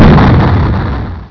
explode

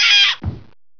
dead dog; Windows

